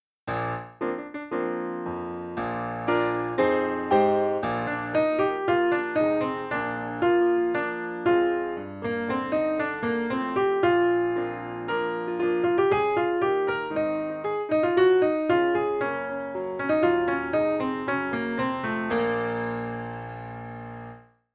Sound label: Melody